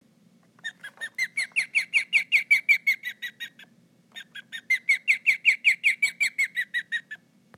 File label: osprey